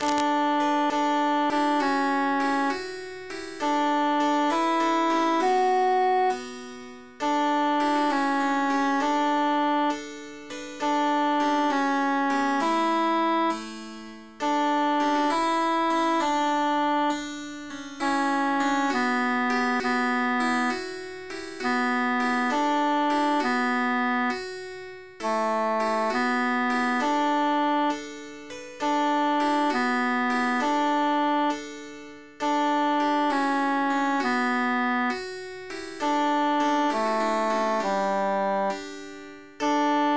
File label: transposed tenor emphasized